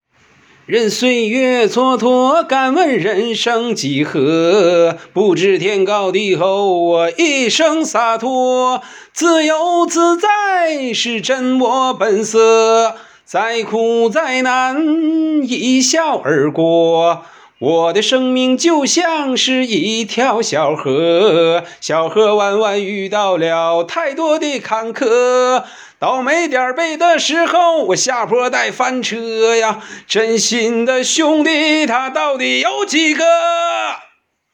二人转版; 源来的我

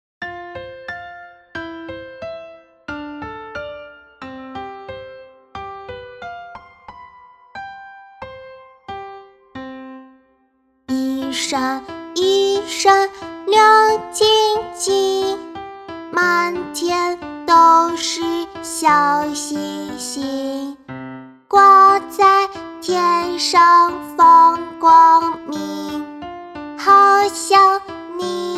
仿童唱歌小星星

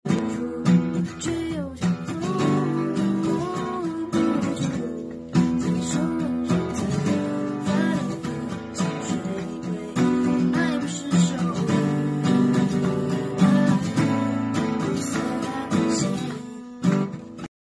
自弹自唱

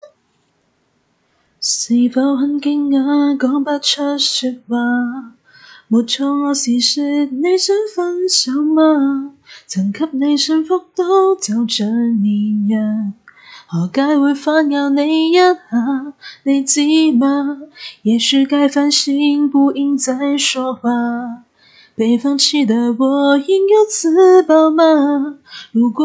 清唱

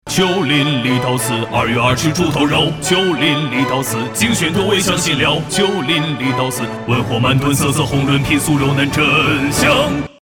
唱歌广告; 秋林里道斯

